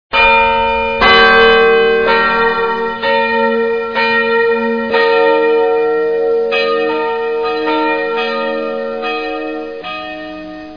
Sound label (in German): Kirche